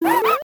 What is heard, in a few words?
DS OPEN